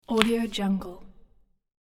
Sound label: broad bean pod crack; domestic sounds